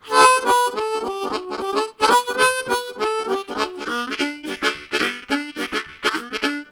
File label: Octave Boogie Pattern, Harmonica; Zero G